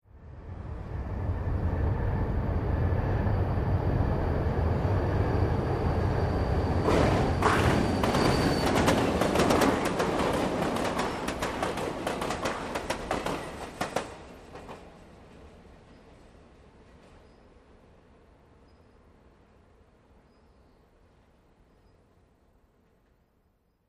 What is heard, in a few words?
Subway Car Out Tunnel